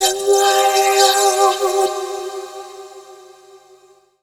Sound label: VOICE FX, Dance Vocals